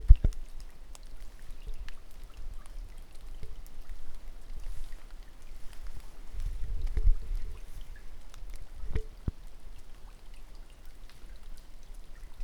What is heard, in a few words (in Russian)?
kapel